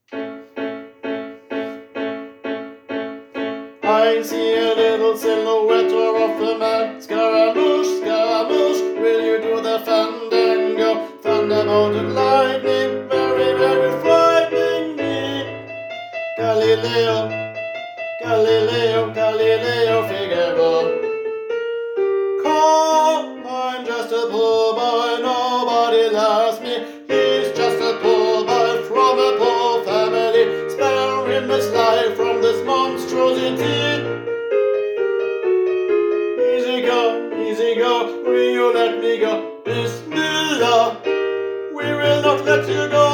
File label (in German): Scaramouche Tenor